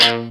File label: ROCK GUITAR